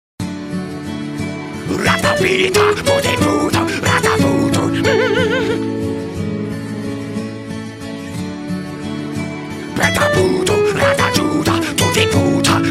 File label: ringtone